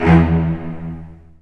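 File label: Cello Spiccato E1; Digital Sound Factory Virtuoso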